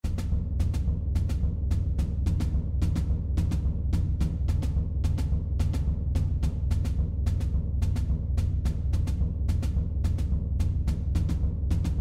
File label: cadence; tiger